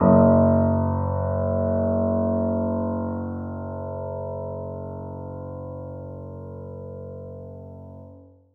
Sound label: piano piano f#1, Grand Piano; Sonatina Symphonic Orchestra